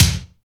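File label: kick bright, ambient kick; Rhythm Section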